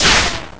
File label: Light Blast Throw; Vaati firing the light blast